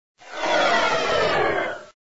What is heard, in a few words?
Degrade; yet another pixel dungeon android